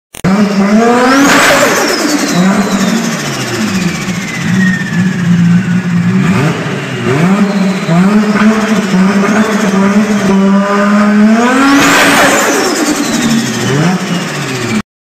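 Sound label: Nissan Skyline, car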